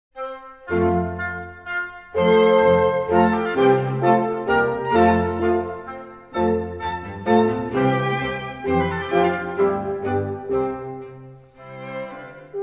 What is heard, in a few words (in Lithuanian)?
Menuetas f-dur; Vaiku muzikinio lavinimo mokykla